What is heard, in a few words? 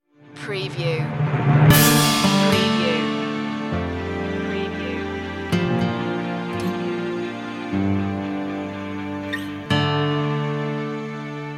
stinger